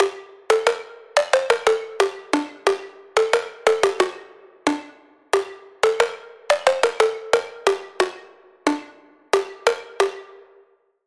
Pluck, Sylenth1; Routed Sound; Sylenth1 Expansion - Story Walker Vol.1

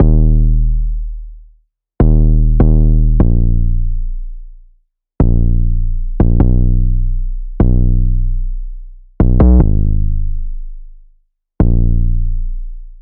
808, Sylenth1; Routed Sound; Sylenth1 Expansion - Street Vanquisher Vol.1

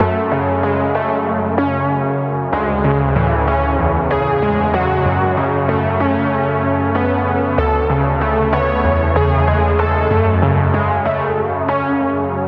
Key; Lucid Life; Routed Sound; Spire Expansion; Street Vanquisher Vol.1

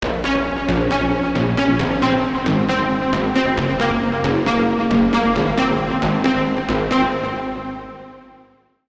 Pluck; Neon Fiction; Routed Sound; Vital Expansion - Reality Maker Vol.1

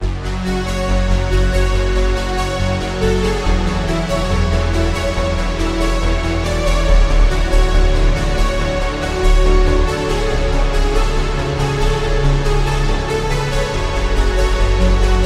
Lead, Spire; Routed Sound; Spire Expansion - Reality Maker Vol.1

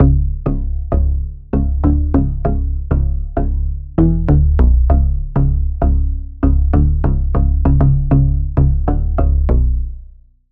Bass; Rivalries; Routed Sound; Spire Expansion - Noble Gangster Vol.1

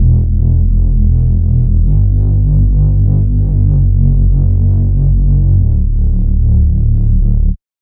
Bass; Routed Sound; Serum 2 Expansion - Star Scavenger Vol.1

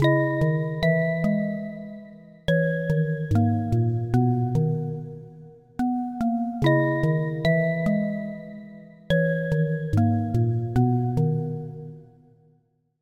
Key, Sylenth1; Routed Sound; Sylenth1 Expansion - Street Vanquisher Vol.1

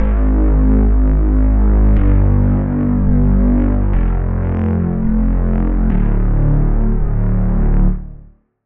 Reese; Routed Sound; Serum 2 Expansion - Noble Gangster Vol.1

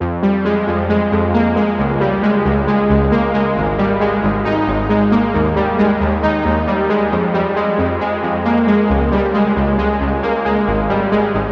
Key; Routed Sound; Spire Expansion - Reality Maker Vol.1

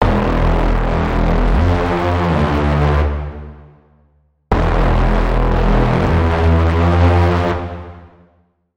Braam; Initiation; Routed Sound; Vital Expansion - Quantum Enforcer Vol.1

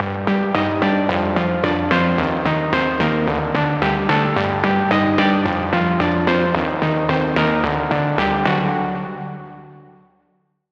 Pluck; Vital Expansion; Street Vanquisher Vol.1; Routed Sound

